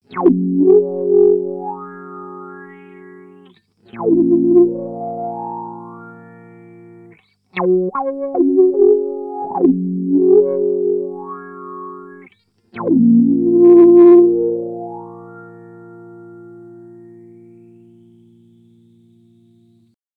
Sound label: Spatial Delivery V3 LP Down; Earthquaker Devices